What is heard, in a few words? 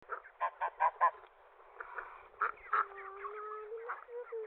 goose